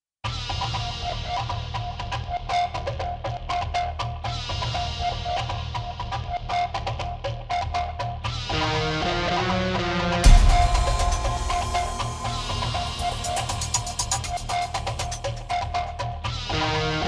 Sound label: Heavy Metal German